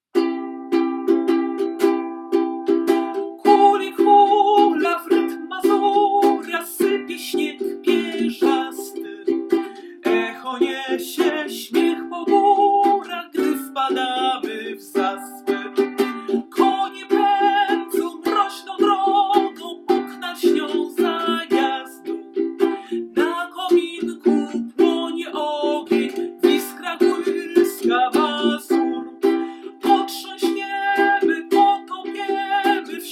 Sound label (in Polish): Zimowy mazur C dur spiew